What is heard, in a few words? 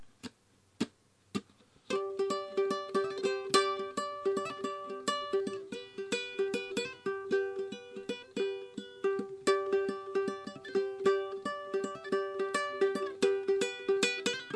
ukulele